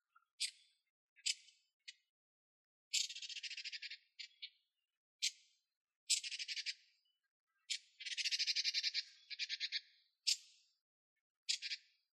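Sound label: soushicho call, red-billed leiothrix; jinaki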